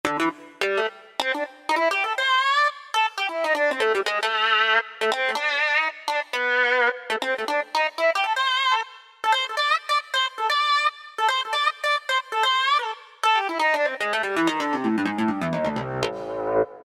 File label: Razor Solo, Generalmusic Equinox